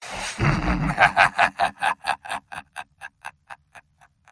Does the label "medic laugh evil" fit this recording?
Yes